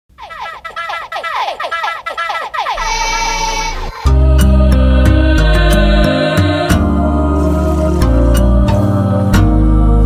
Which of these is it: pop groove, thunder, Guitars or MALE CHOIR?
Guitars